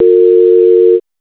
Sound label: line ring; IP Phone Development Kit; pxaemu